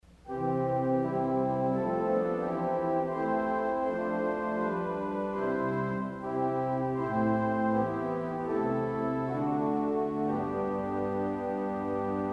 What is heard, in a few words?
Viola Celeste St Anne, organ; CulverAcademy